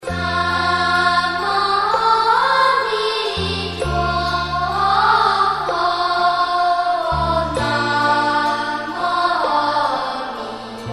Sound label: Namo Amituofo (kids voice) 六字二音 童聲; Pure Land